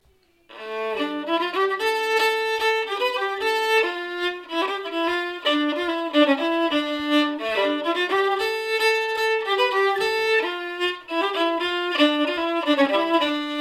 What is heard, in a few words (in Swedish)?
Norsk Scottis